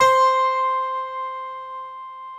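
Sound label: six pianos, Distant Stack; Optical Media International; Sonic Images Library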